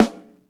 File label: snare, Real